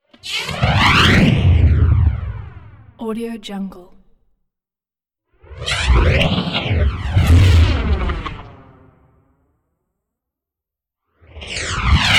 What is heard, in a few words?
fire ignite intense over modulated flame bursts